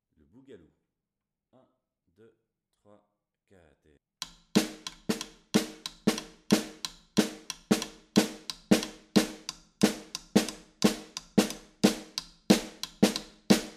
Boogaloo Snare, Batucada

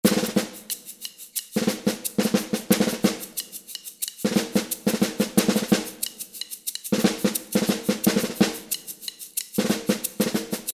Tribal Style Snare, Batucada

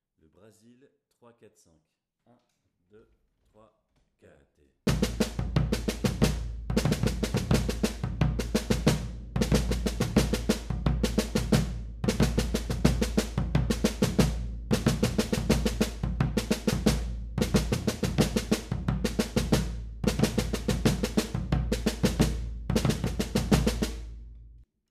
Brazil 345, Batucada

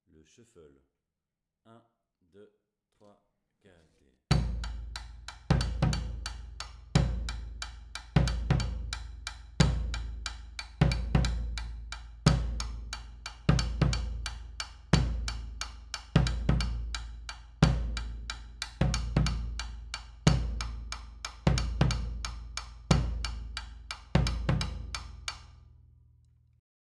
Shuffle Bass, Batucada

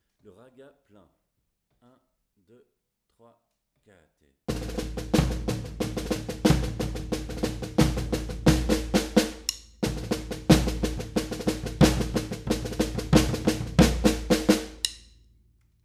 Ragga Plein, batucada

